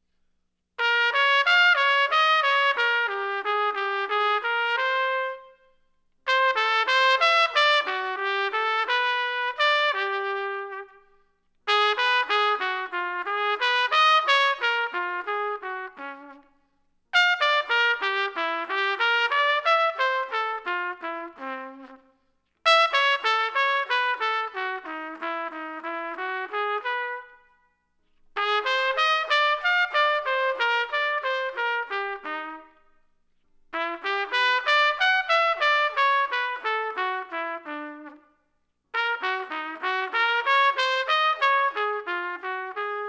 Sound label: trumpet; Trumpet Lesson; Audio Analogies